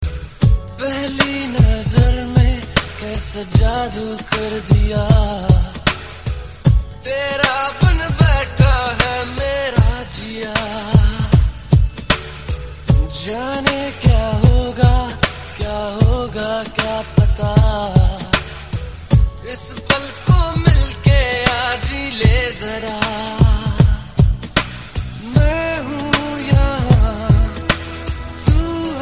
Slow Hip Hop

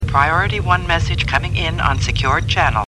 Priority Message

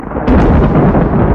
Close

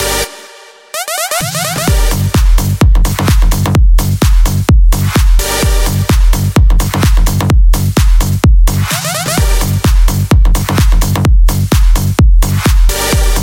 Sick EDM Beat

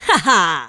Haha; Princess CR

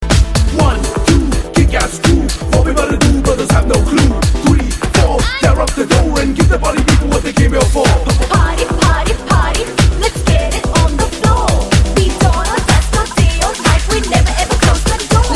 Chill Out

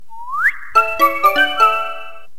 Tring

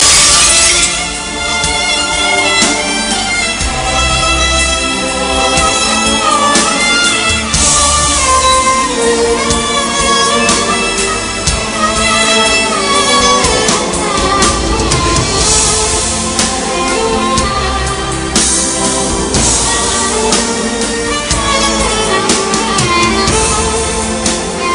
Sad Ringtone

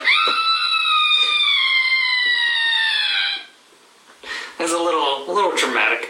Dramatic

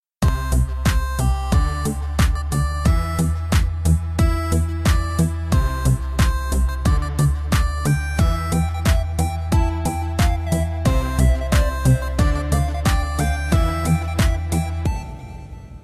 Techno Theme; Jontron

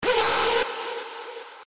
Screech

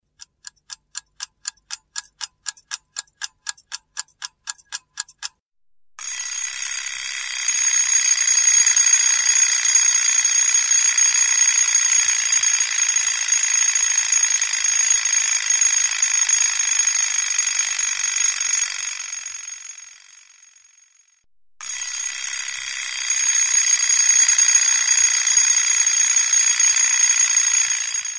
Alarm Clock

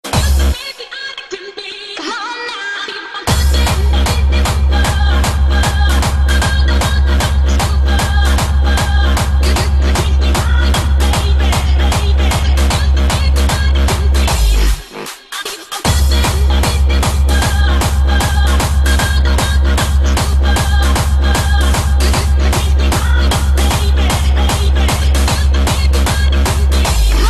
Hardstyle

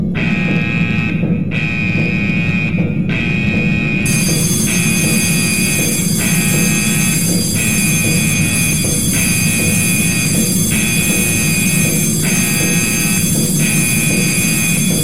Navy Alarm

